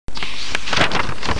Snd Page; CD Action

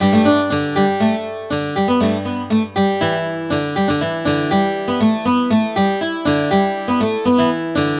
harp irl; MOBICLIC 14; europe

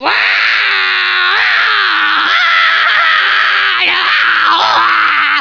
BUMP; Screen Wreckers

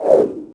gas; Thief

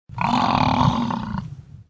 buffalo; WMBonusXP

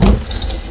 Drawer Big Open

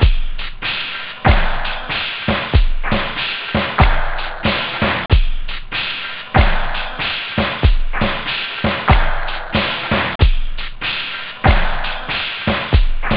break, house; OctaMED SoundStudio V1

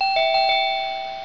DOORBELL; 2000 Sound Effects

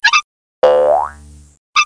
bounce

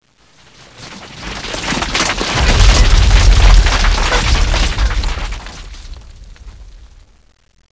rock roll

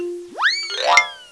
ZING; Music Game (1994)(Microforum)

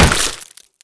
hit wood

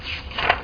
page for; Corel Professional Photos; Rural France

